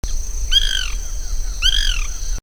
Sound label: Melanerpes candidus